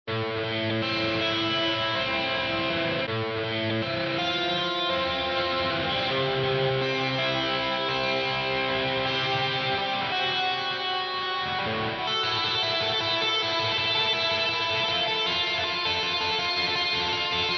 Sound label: guitar